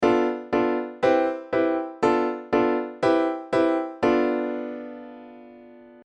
Locrian; Modal Harmony: An In-Depth How-To Guide

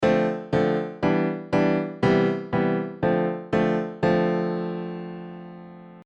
Dorian prog; Modal Harmony: An In-Depth How-To Guide

